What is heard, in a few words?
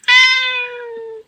cat sweet voice; animals